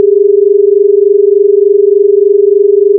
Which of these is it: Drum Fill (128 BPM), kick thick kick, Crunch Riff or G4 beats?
G4 beats